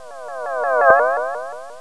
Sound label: ALIEN RAY